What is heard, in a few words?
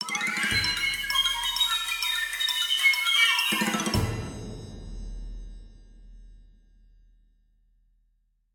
Squirrel Attack; King's Quest Chapter 1: A Knight to Remember